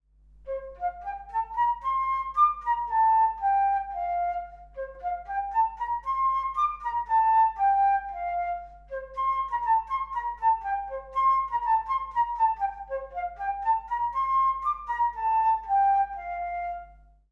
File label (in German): Sound Floete; blaeserjugend; musikmentoren